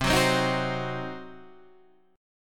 C Diminished

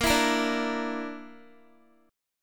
A sharp Major Flat 5th, Guitar; strummed; chordshape

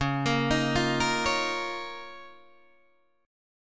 C sharp 6th Add 9th C sharp down Guitar Open D

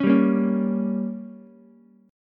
G Diminished, Ukulele; strummed; JGuitar

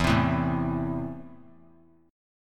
E Suspended 4th Sharp 5th; strummed; JGuitar

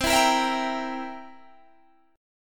C 7th C, Guitar Open G, chord

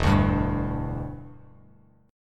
E Minor 7th Bass Standard; strummed

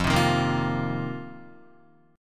F 7th Suspended 4th F down Guitar; F7sus4 strummed

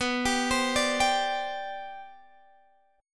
C Suspended 2nd, B, guitar chord; arpeggiated

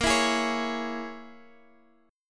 Bb Minor 7th Flat 5th, Guitar, Open G

